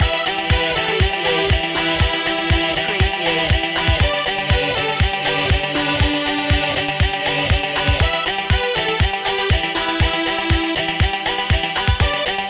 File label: dubstep looping